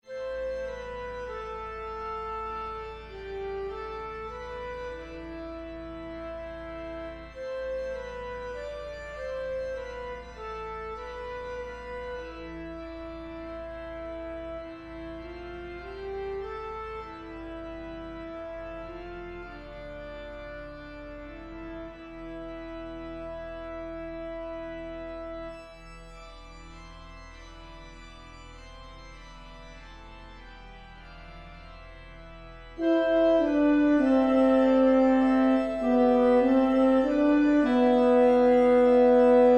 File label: Kyrie Tenor; stmarysbruton